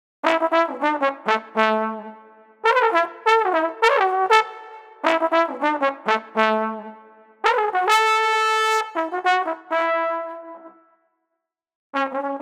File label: Trombone Jovial 100